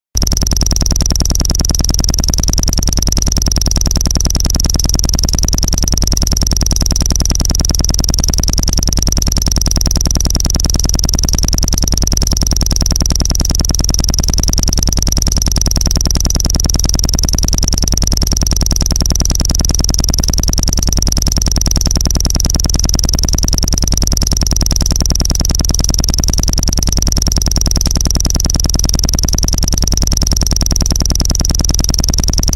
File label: Morning Noise Broadcast